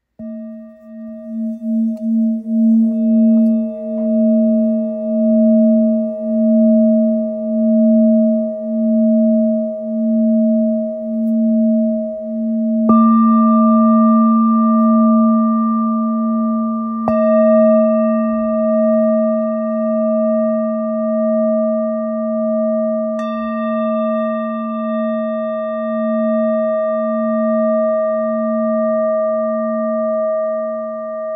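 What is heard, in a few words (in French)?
Bol therapeute re diese, bol chantant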